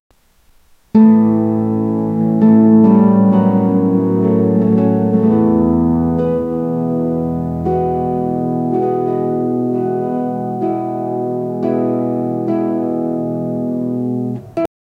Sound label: piano and guitar sound; instrumental